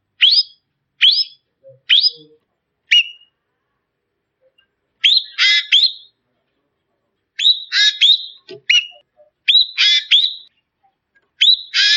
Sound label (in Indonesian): Suara Burung Ekek